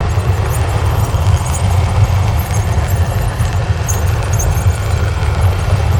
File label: Rail Post Fire; Ninjago